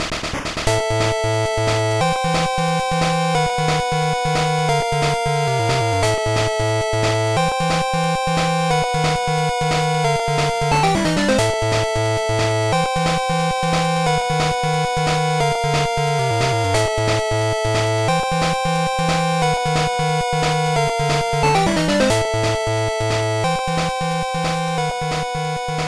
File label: Enemy Dialogue Chiptune Version; one piece going baseball kaizoku yakyuu